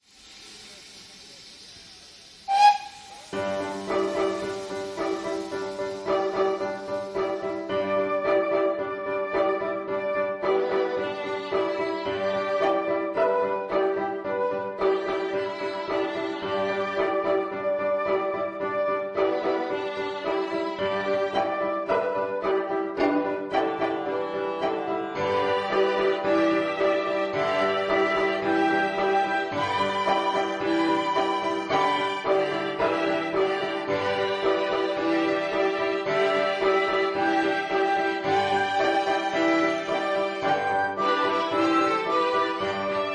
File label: Train whistle accomp, viola